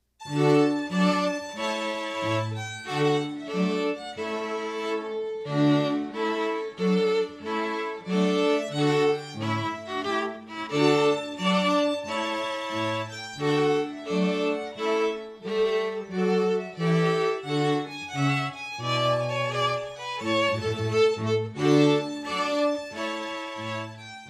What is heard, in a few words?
Trumpet Tune